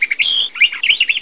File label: bird; quake2